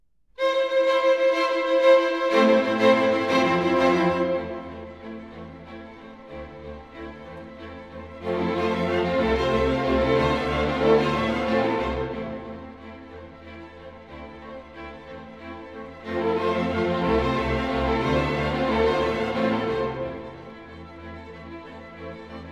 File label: string orchestra